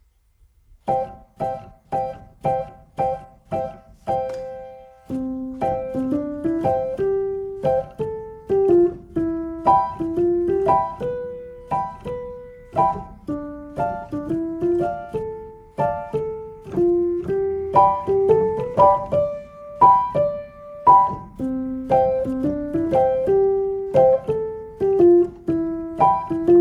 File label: piano version